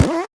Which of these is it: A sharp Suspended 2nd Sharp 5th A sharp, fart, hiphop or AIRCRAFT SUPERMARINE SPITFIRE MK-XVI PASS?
fart